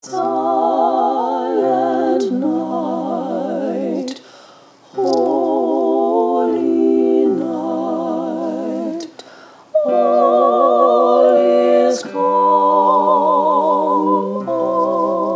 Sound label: a cappella; silent night